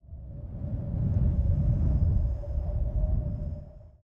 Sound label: wind moan